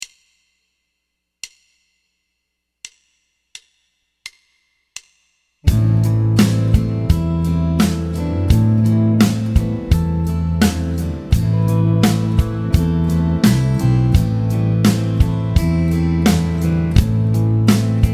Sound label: Triad Vamp MIN A